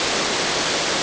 waterfall; Ambient; MX vs ATV Unleashed; XBOX

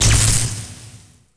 conduit slash; impacts explos

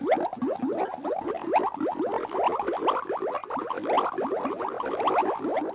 BUB AMB

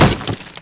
wall crumble small

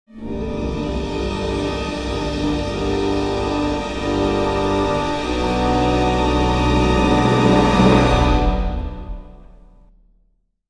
negative, Stream Music; Star Wars Knights Of The Old Republic II; XBOX

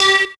funpark light floor; Tony Hawk's Project 8; XBOX

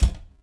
bad part

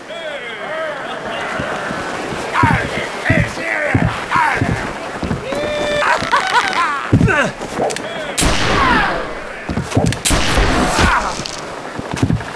duel spin intro sfx; Sid Meiers Pirates; XBOX

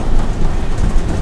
conveyor luggage loop

